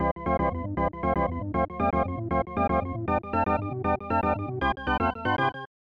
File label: Fanfare ~ Excitement; super bases loaded 3; snes